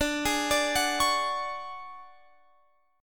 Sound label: D Major 7th Flat 5th D down Guitar Open F; DM7b5 arpeggiated